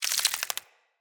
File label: Infested Ashen Move Turn